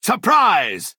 attack; mournhold traitor

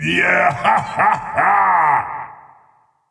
Laugh; Molag Bal

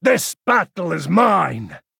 attack; falkreath ravager

